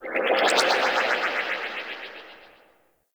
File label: Ship Tech Chirps; Warframe; Corpus Ship Remaster